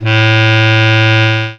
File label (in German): bsc a2, MIRAGE Bass Klarinette; Retro Sampler